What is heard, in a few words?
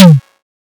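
bass, drum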